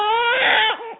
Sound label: crying, baby cry